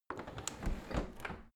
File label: window handle close tilt; objekte; fenster